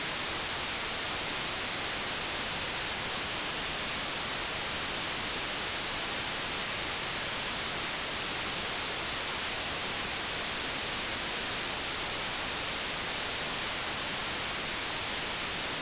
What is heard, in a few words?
white